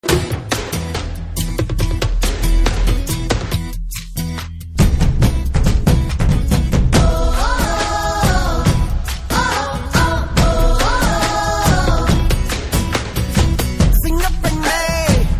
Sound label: ringtone with drum beats; tamil ringtones